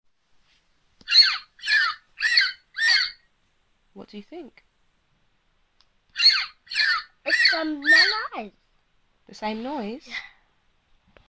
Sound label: Growl with the animals